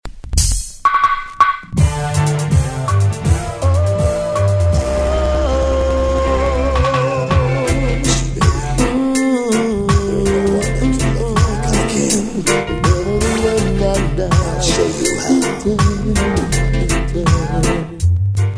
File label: SAX